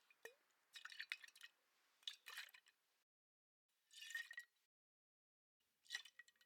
Liquid In Square Glass Bottle

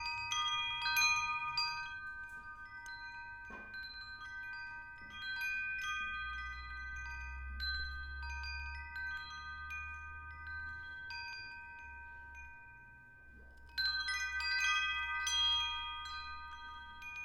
Wind Chimes

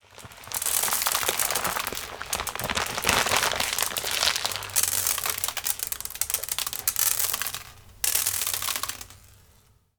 Rice grains

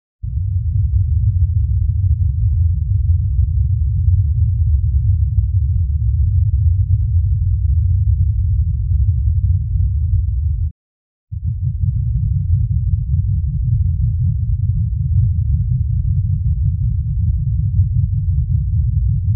Megabass Whitenoise; Hear No Elvis

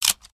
Snapping Pieces Of Wood

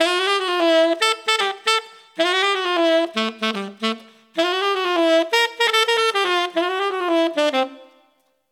Uh Huh That S Funky 16beat Dmaj 110

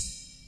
Ride

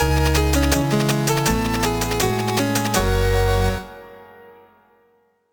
Chiptune Intro

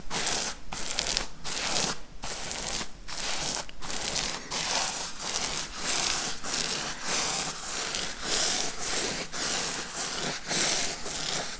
Painting Wall Loop

